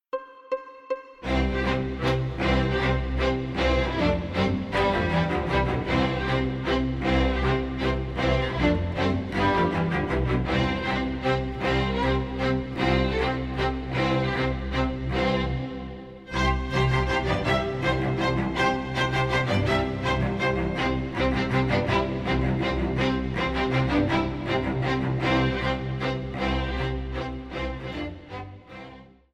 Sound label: piano